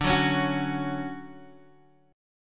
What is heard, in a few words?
D Major 7th, Guitar, Open D